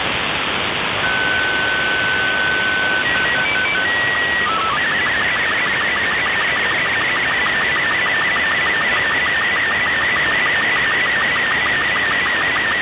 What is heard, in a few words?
6990khz usb